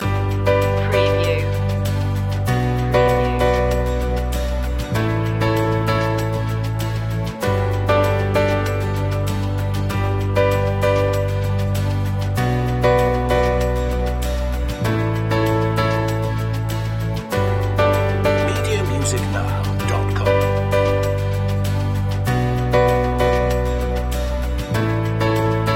petra k loop

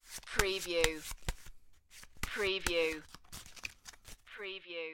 Cards Playing Cards Shuffling

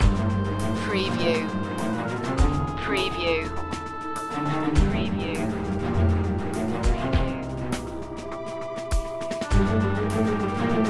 tense shadow loop

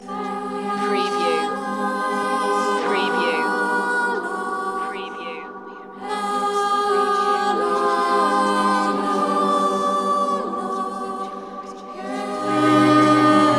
celtic requiem loop

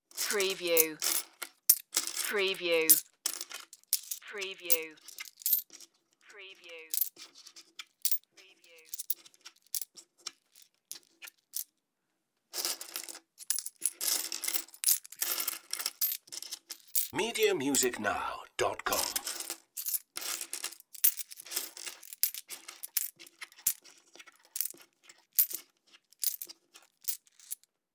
collect coins from table

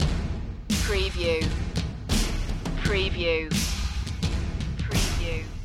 percussion loop

